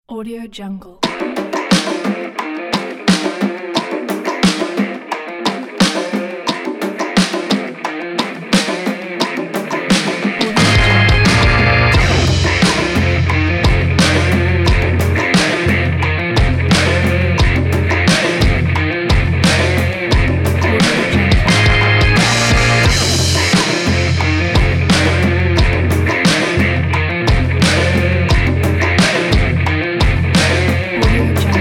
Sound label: action background